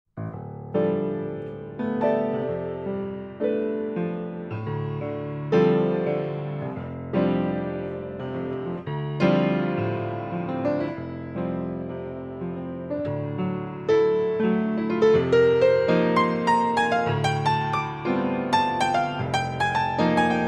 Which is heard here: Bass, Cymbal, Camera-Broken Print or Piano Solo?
Piano Solo